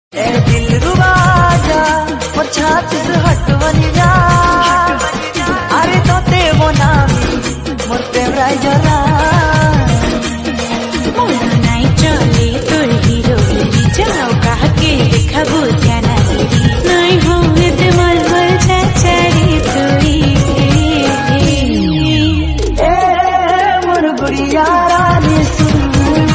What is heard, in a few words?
Odia Song